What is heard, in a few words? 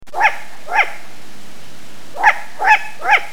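long-eared owl; torafuzuku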